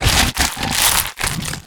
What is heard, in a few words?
idle tide bite; dota